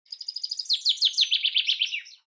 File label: Common chaffinch chirping in nature